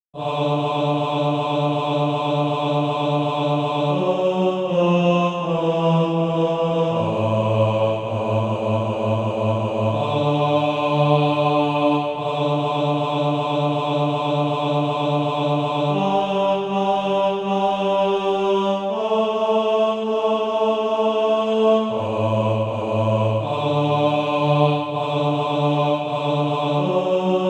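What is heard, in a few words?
bass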